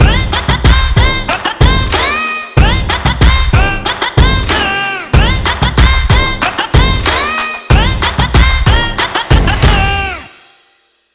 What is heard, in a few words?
intro